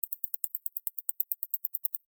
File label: Insect Walk Small, Insect, Walk, Small, Movement, Bug; Sound Effects:Animals:Insects; Blastwave